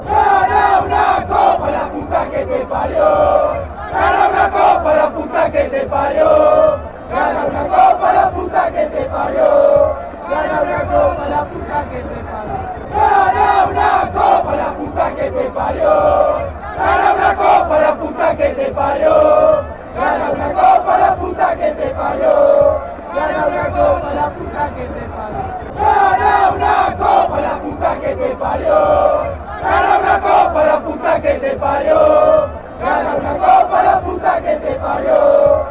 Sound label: uruguay chant, fanchants